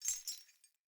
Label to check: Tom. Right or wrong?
Wrong